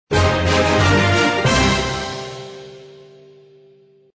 Battle Win; Clash of Clans soundtrack

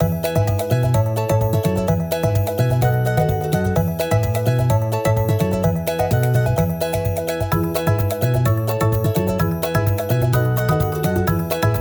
birthday musicloop; easteregg

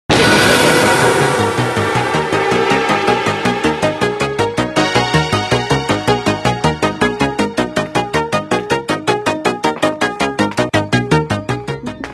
Chase Music; Ice Scream

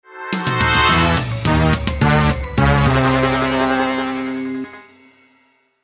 Race Lose; FreezeME

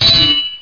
Clang; Birthright The Gorgon's Alliance; ms-dos, windows

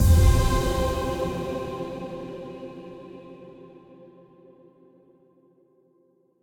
Tessellation Combo; Mixolumia

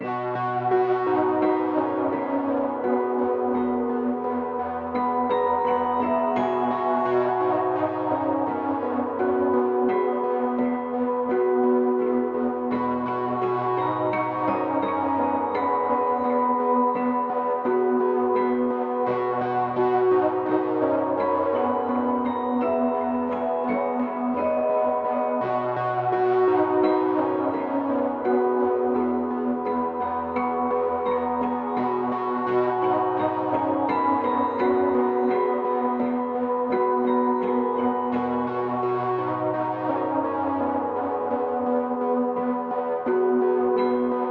Loop 85bpm; jenny leclue detectiv

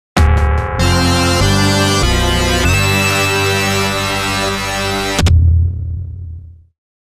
Bell of Final (GAME OVER); Irem Retro Game Music Collection 2

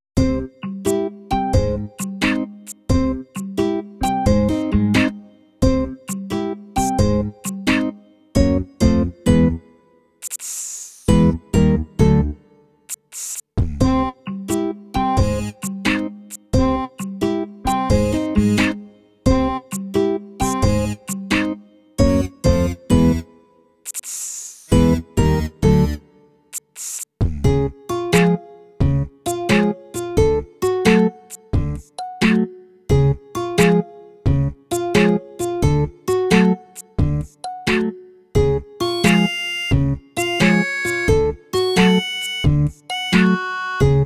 BGM conversation; Puni Fugo

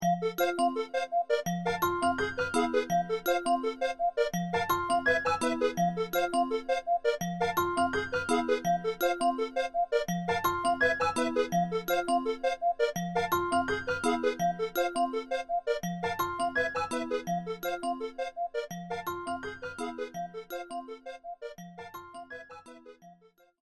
Quiz Show Telephone; Animal Crossing amiibo Festival

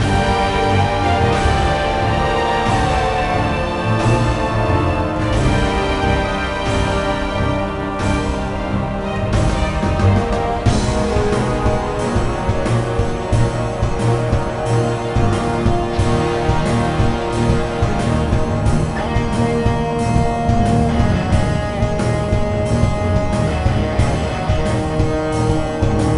BGM Defeat Norse; Age of Empires Mythologies

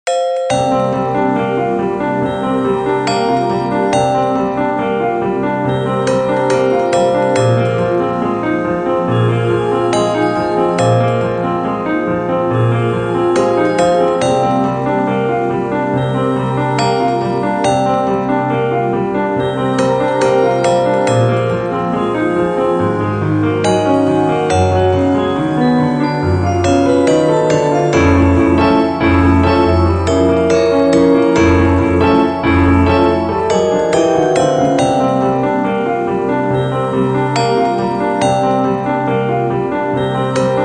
Music Success event

